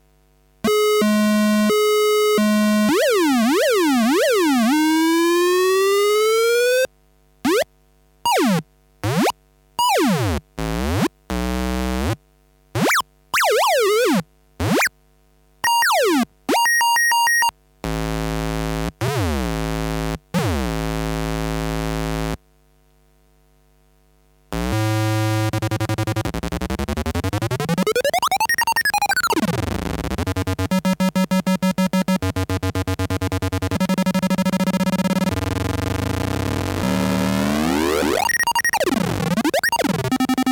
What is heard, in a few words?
Dubsiren sound sample; Delfy Original